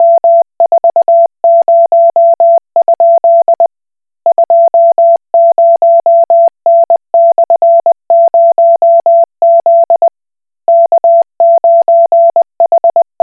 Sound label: Koch Morse